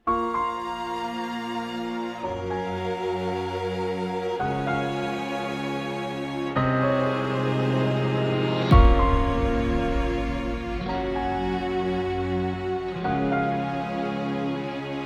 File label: Beautiful Tomorrow Instrumental; Wuthering Waves